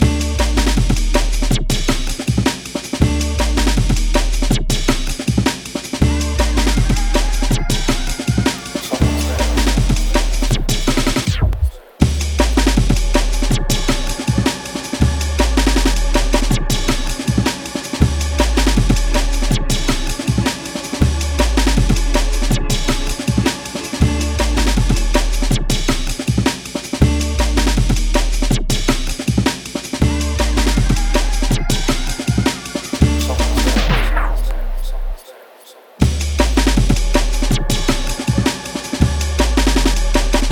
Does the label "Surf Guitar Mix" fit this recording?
Yes